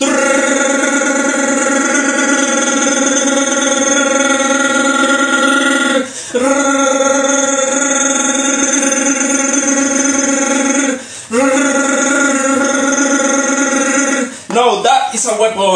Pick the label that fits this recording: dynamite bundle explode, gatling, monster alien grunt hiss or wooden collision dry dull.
gatling